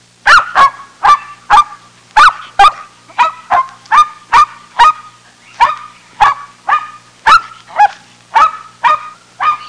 small dog barking

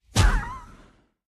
Weird Drop variant

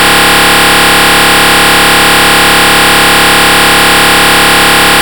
Error Tone Steady Low

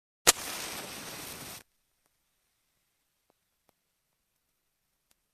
Match lighting up

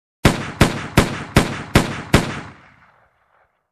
Multiple gun shots with echo

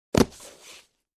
Closing a book

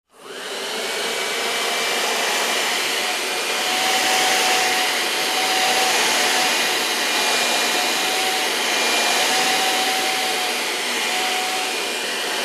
Vacuum cleaner

